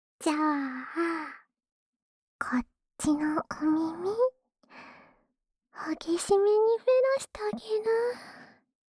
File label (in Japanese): 無垢 喉奥左耳フェラ; フェラ素材